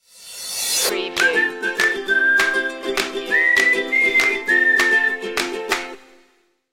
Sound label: upbeat ukulele stinger